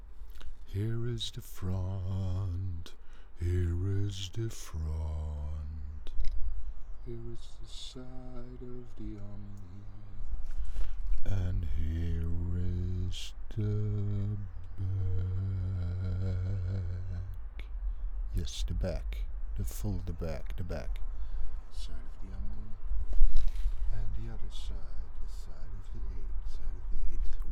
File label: Voc Fig8